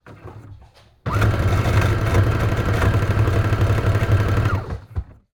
troubled sewing machine tries to run with tangled up thread fast, rhythmical, mechanical; Electric sewing machines; Omar Alvarado